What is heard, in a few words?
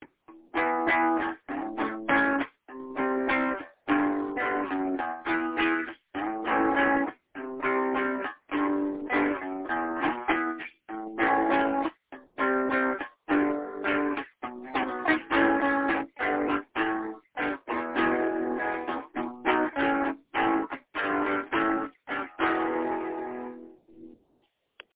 ish chords